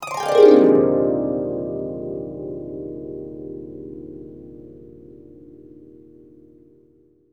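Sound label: harp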